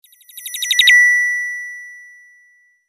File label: ping